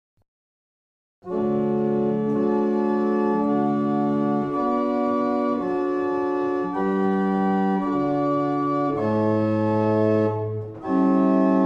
barrel organ; Mattishall

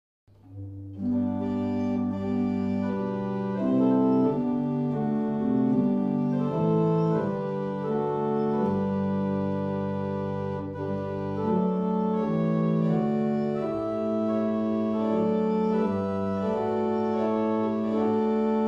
Morning Hymn, barrel organs; Fobbing; The organ is operated from the rear. The softwood Gothic case has three flats of gold pain

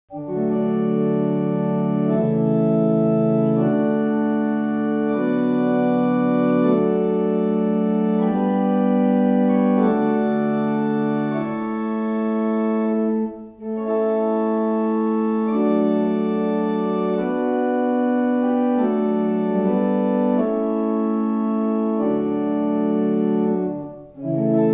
barrel organ; Llanfair

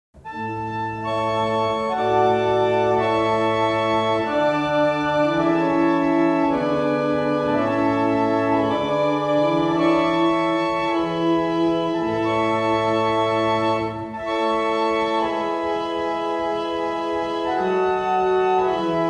barrel organs; Hampton

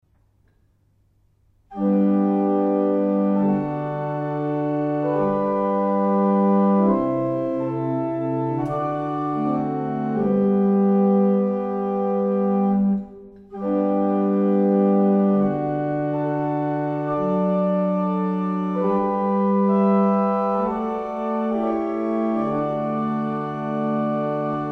barrel organs; Faulkbourne

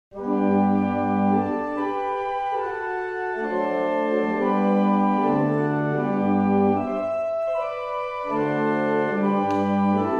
barrel organs; Stratfield; Austria

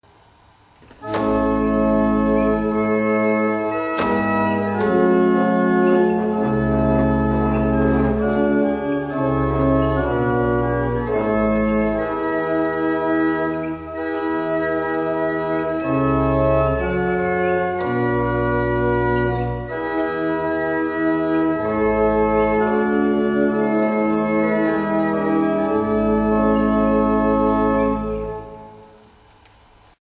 Double Chant, barrel organ; Tittleshall; Norris

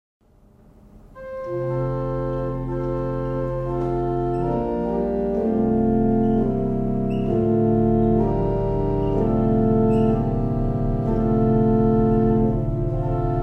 Morning Hymn, barrel organs; Edgcote

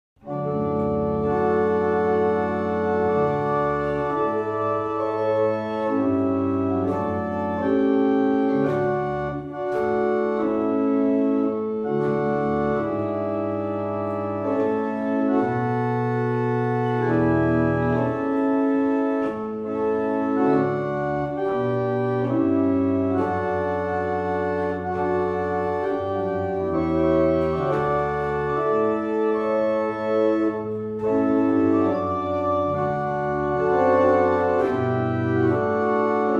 barrel organs; Brightwell

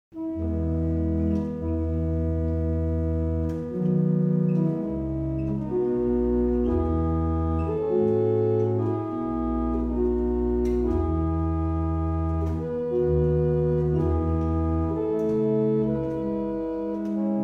Irish, barrel organ; Witton

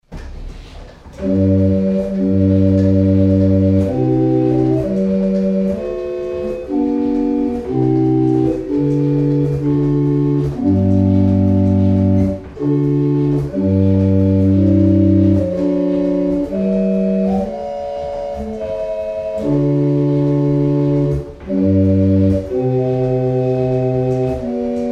barrel organs; Boxford; Bristol

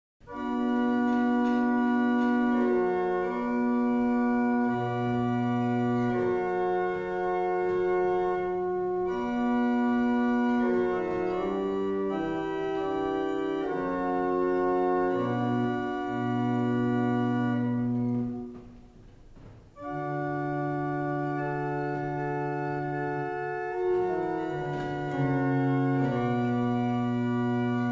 Double chant, barrel organs; Wissington

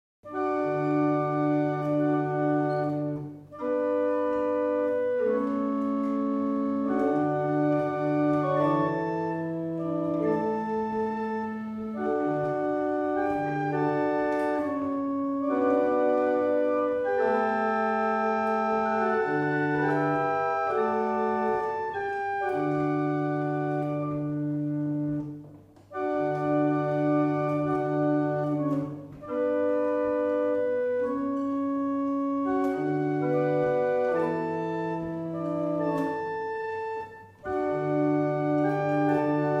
Double chant, barrel organ; Winwick

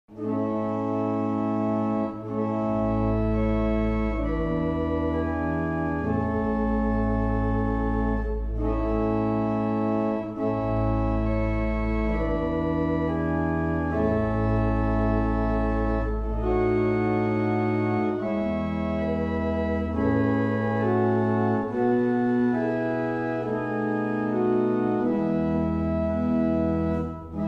Canterbury New, barrel organs; Meldreth

